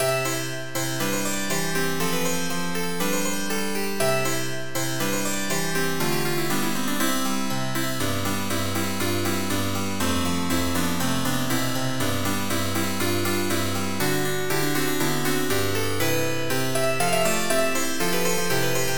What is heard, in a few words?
BAROQUE